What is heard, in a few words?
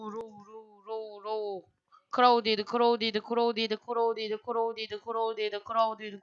crowd